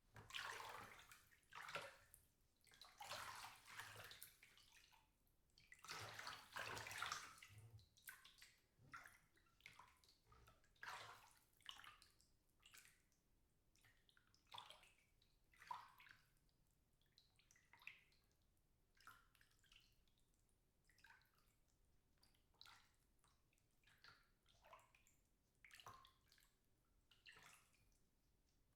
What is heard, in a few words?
water movement in bath